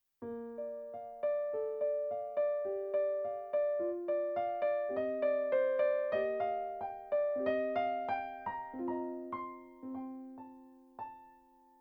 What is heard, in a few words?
Backing